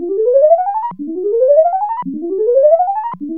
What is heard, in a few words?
sequence, spacy sequens; Zero-G Ambient 1